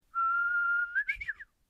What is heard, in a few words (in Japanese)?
ウグイスのさえずり